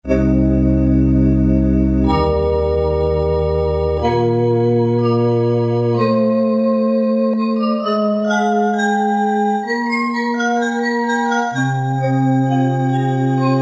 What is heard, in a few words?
Glasses, Roland MT-32